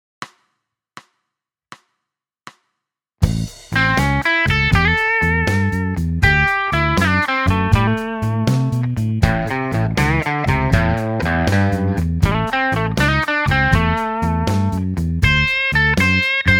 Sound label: blues